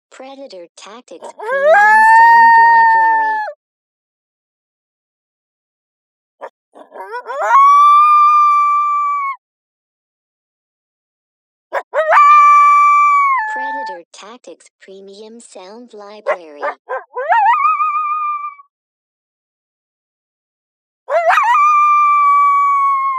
Female Interro

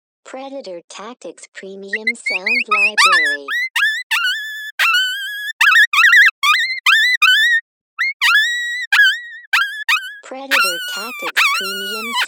Pup Distress

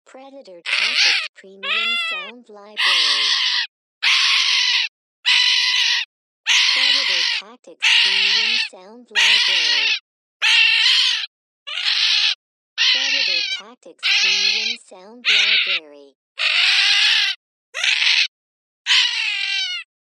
Young Coon Screams

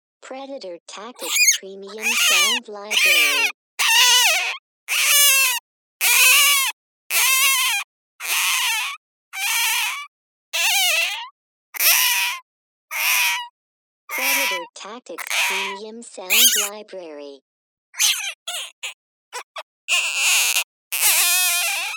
Hare Lip

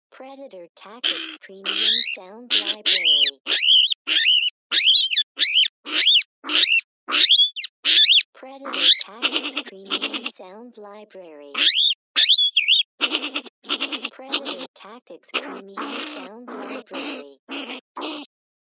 Little Creature

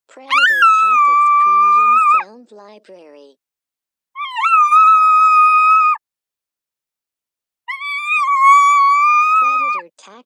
Cardinal High Pitch

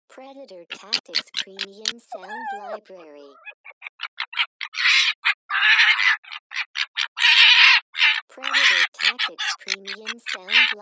Red Fox Quarrel

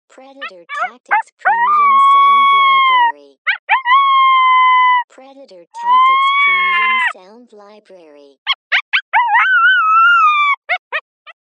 Female Pup Howls

